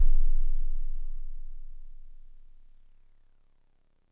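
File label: DreamLike DX100 C sharp 0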